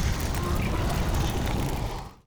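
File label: elemental idle; monster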